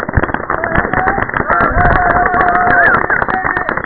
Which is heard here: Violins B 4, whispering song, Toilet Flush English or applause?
applause